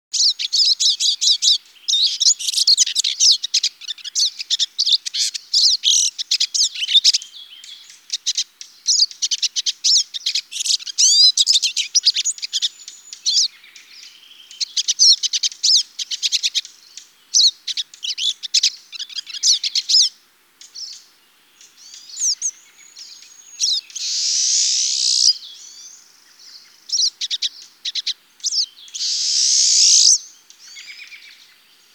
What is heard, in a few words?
Sisken pine; birdsong